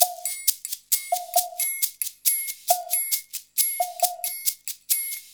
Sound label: 90 MIX, Percussion Loops